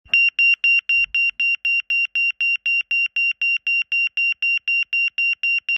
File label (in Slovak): tón č. 22, opticko-akustická signalizácia; LED opticko-akustická signalizácia A-MLOAS 24V AC/DC